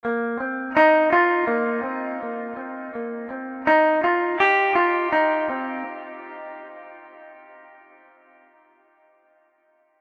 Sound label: Big Rock Guitars